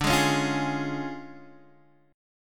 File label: D Minor Major 7th Flat 5th D Guitar Open D